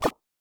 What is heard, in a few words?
Musical Square; FGUK